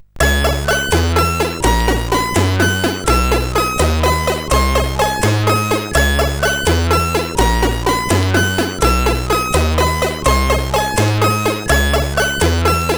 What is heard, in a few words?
Weird Song, CSG8580R5